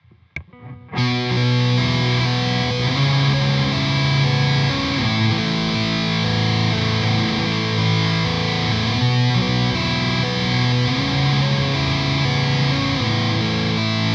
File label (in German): gitarre crunch solo; presonus ampire xt metal pack test